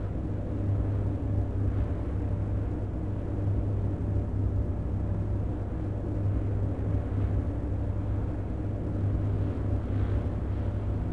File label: amb alleyway; Star Wars Obi Wan; XBOX; coruscant